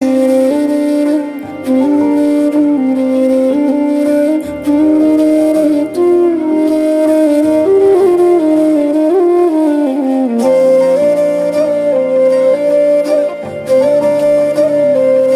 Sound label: Flute Instrumental Version Ringtone